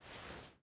ironsights down; Rust

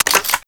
reload loop, foley; spas12

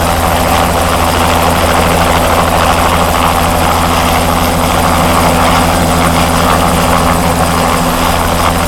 littlebird idle loop, vehicles; Call of Duty Modern Warfare

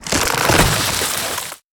objects, World of Tanks

